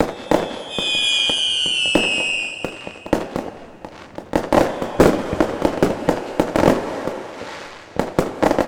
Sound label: arena fireworks; Pix the Cat